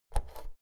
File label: oven knob press in hard